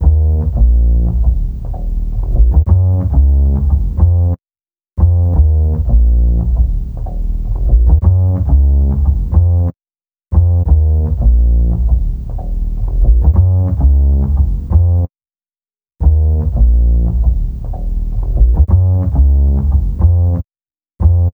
Bass, Hip-Hop

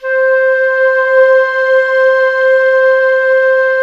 STR, Orchestral Strings

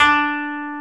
KOTO D3 -R; USB Soundscan vol.60 - Asian Traditions; AKAI

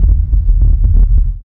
RUMBLE; East West - Phat & Phunky

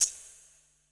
E SHAKER, ETH PERC

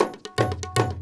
INDIA L; Spectrasonics - Heart of Asia CD2

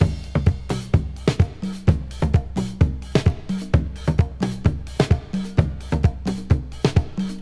breaks

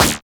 LAZER; LAZER BOY; TEK PERC1; Drums In Your FACE!

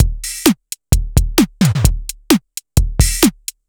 Synth Loop, BPM130

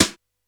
Brush Tap, Standard Kit, Yamaha PSR-E323

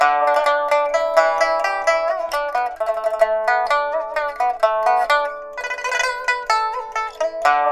PIPA, 90; INIS - Opium

